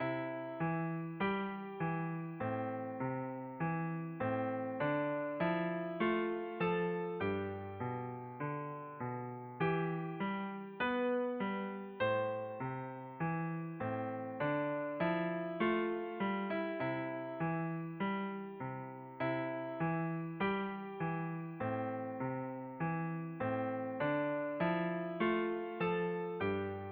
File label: Accompaniment